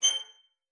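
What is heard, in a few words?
violin spiccato G5; Xsample VOL-5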